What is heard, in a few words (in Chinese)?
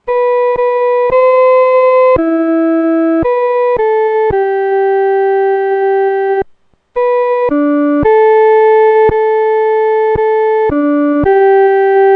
独奏 第一声